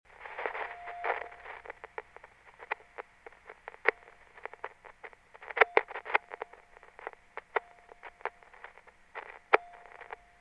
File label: mr distorto crackles